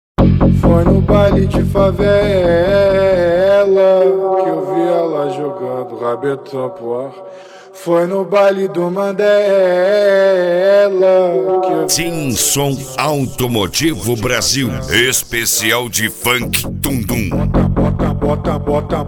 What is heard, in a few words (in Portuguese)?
funk tum dum